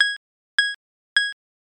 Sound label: glass tap, ui sounds; mikroconsult